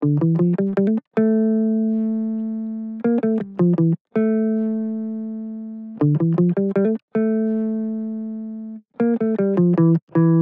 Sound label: 160 BPM D Min Lead, Guitar; Loop Stems; Cymatics; Dreaming